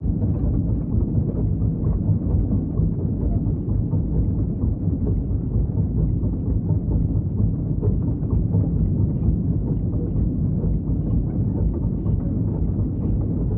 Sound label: ship engines; World Of Warships